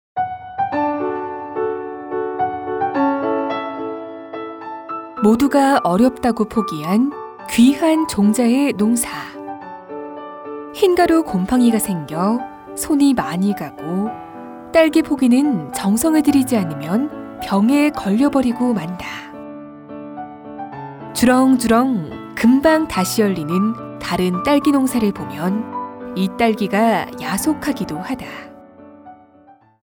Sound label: BGM; 한방딸기